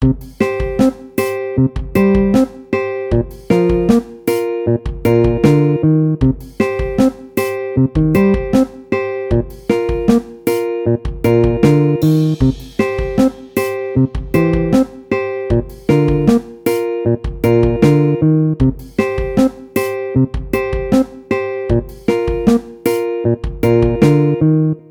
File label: ping-pong game